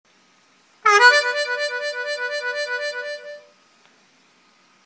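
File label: trill, harp; Трель на отверстиях 3 и 4; губной гармошке; Трель на губной гармошке – это очень красивый эффект.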